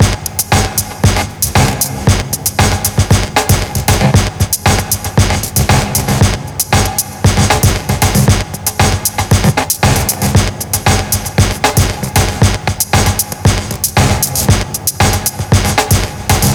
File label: loop